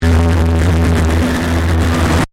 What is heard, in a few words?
Distortion Stab; Blast Wave